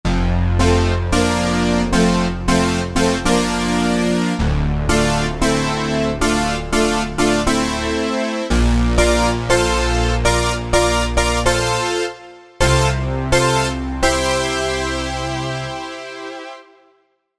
80s Retrosyn

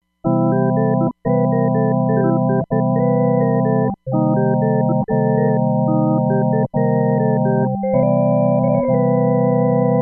Chorus + Long Hall, Roland DEP-5; Factory Patches

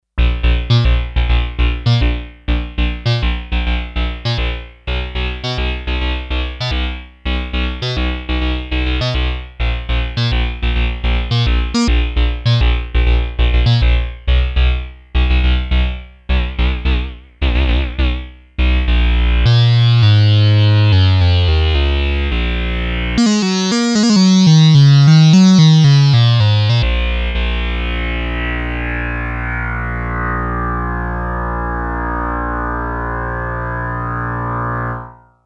Cut bass 2 unison, Sequential Circuits Six-Trak; Factory Programs

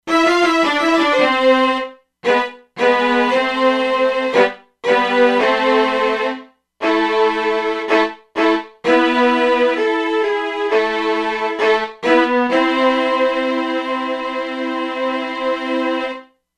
Arco Violins, E-mu Proteus-2; Factory Presets

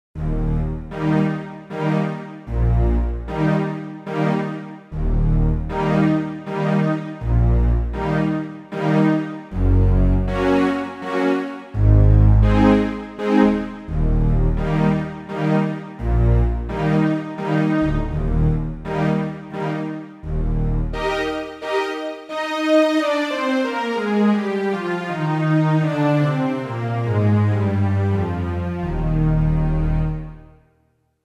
Orch Strings, Roland JV-880; Factory Patches

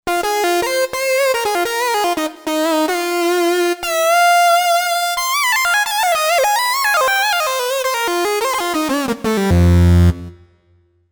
Lead Sync Lead

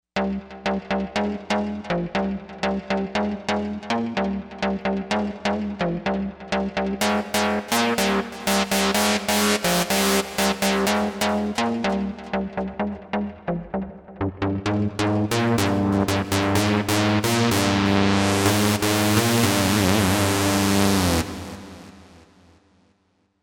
Stabolic

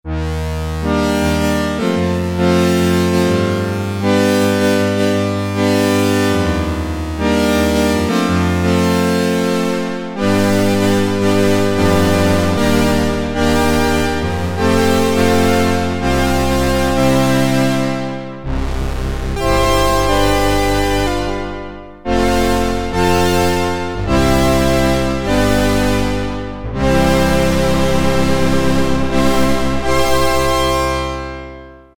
Comping, Sequential Circuits Prophet-5; File 2 Programs

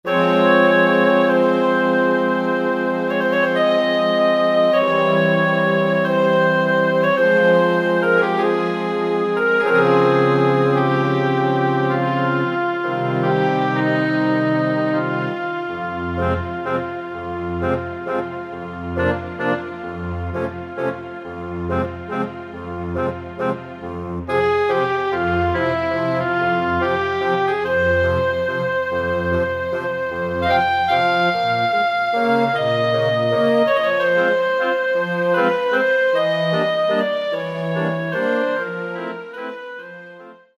Woodwinds w Str, Kurzweil K2000 Orchestral ROM; WWINDS; Orchestral ROM Supplemental Disk

